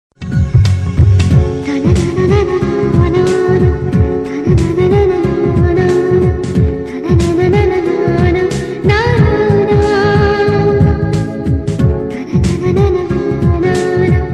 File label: positive vibes love bgm Tamil; thala ajithkumar